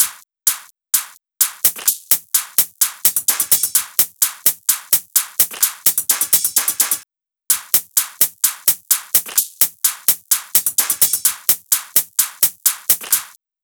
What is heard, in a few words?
Drum Loop 128BPM Perc; VEC5; Drumloops; Multi Loops